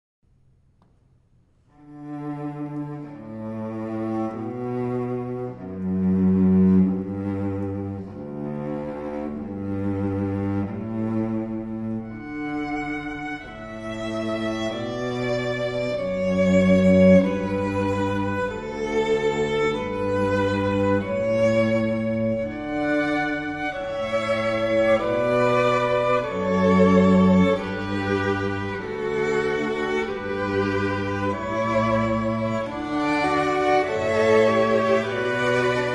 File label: canon, string quartet